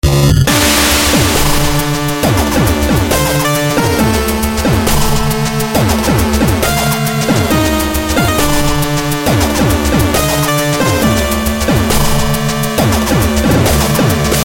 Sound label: Chip tune; bnha